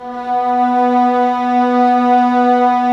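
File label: violas sordino; Vlas Bow FX; Vas Sordino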